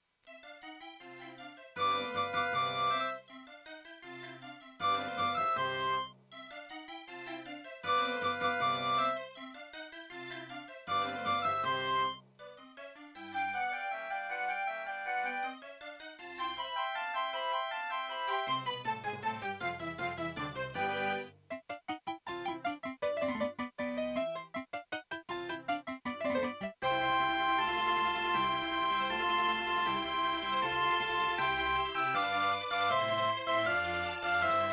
Mozart Concerto K467 partial Labway LWHA111800 (AdMos QS700)